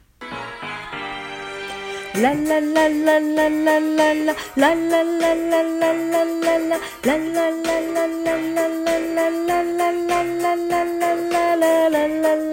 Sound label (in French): refrain voix; pupitre tenors; voix 2; enregistrements de travail